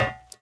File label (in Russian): ravnomernij odnotonnij stuk kapel dozhdya